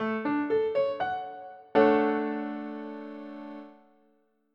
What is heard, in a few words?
D MAJ7